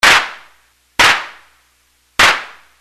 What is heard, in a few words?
modular clap